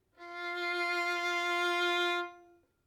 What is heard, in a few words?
Violin F 5 Tenuto Vibrato